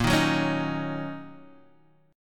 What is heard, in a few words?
Gb 6th Bb